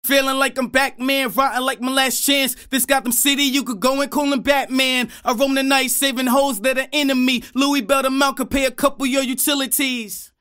New York Acapella

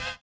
collision; Spectrasonics; Metamorphosis

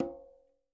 Quinto, Percussion

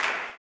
CLAP, Blues Soul; Spectrasonics Vocal Planet

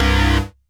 TEKNO, Hyper Dance, FX; Best Service

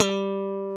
GTR Funky Strat; Funk & Mute; Club-50 - Foundations

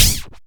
Snare, dry snares; Quasimidi Rave-o-lution 309

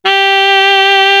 M1 sax

